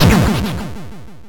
voodoo explosion

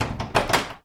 door open; voxelands

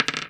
dice throw; minetest

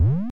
bladder move

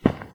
silt footstep; minetest; pedology

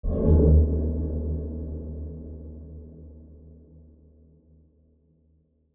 player death

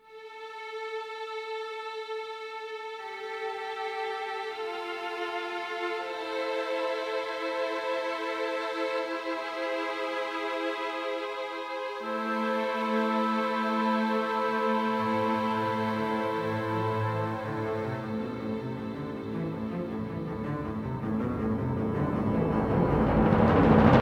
intro music; megaglest

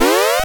sweet collect

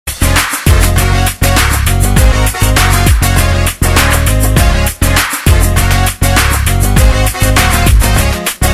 music; 12306 ticket helper

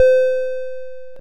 timeout

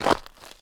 ice white footstep; minetest; pedology

